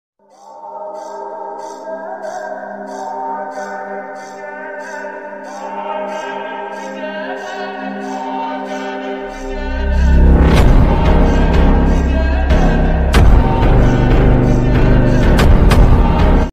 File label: funk very slow reverb